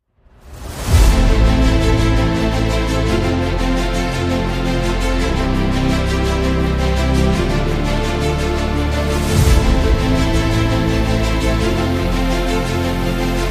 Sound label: Dramatic Adventure